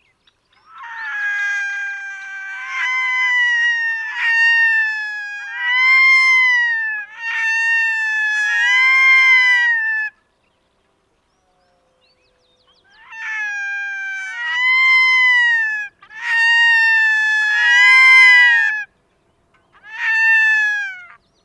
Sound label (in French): chant du plongeon catmarin; andrew spencer